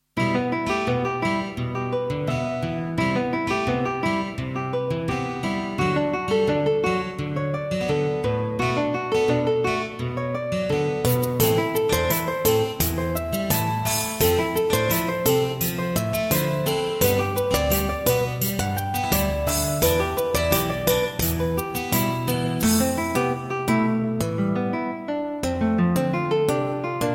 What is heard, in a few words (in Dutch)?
fusion, Een periode zonder werk, berustend.; AG fusion